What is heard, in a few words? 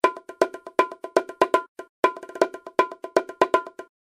Alarm Bongos; Samsung Z2; Ringtones